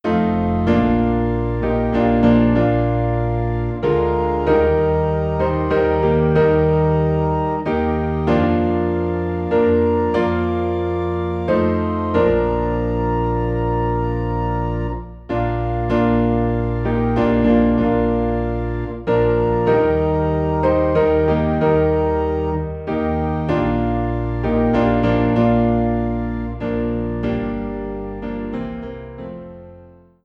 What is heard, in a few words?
Piano and Organ in B Flat; The Comforter Has Come